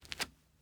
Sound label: masonry jump; teardown; ps5 windows xbox series xs